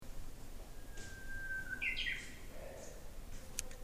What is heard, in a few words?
uguisu